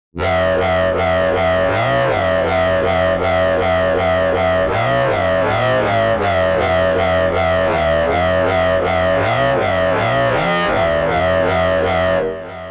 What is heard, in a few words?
Synth Mono